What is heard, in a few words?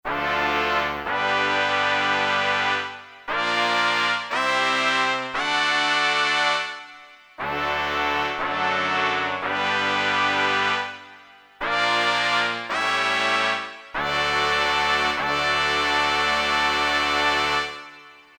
BRASS, Korg DSS-1